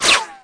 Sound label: Clicky Gadget Things